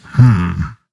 map More Search; rage of the seas

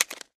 button Bet level; jacks or better multiple hand

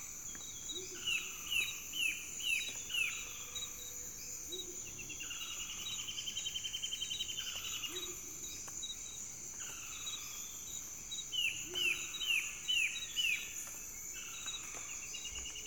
tropical Forest; gorillakingdom

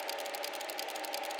reel Spin Free Spin; jackhammer 2

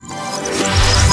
Big Win Intro; dazzle

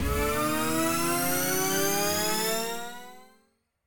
respin Snd, slots; king of slots

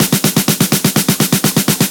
music loop snare fill; disco danny

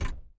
reel bounze Low; cashnoire

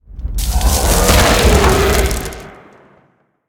boss emerges; wildworlds

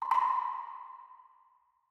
nudge; rise of maya

